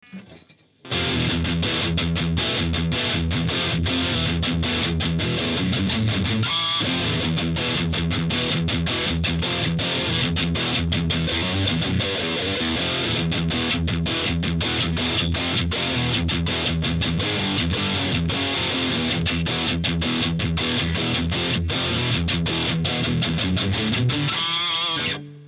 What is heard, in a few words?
Metal Les Paul